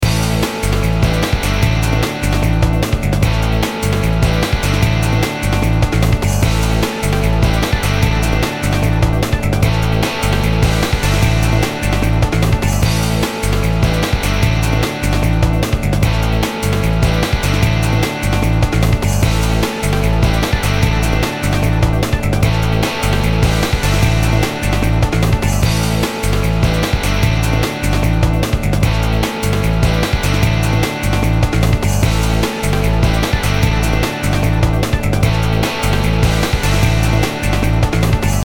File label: full speed action, Music Loops; Music Loops Pack Bundle